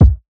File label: kick moving; Drum Sample Pack; One Shots; Slate; SEISMIC